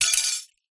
impacts glass piece broken light bulb fluorescent drop break smash